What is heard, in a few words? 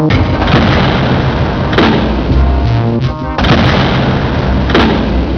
doors